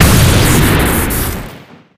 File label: diamond back crit, weapons; tf